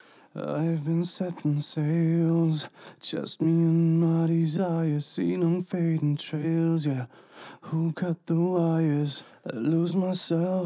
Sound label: vocals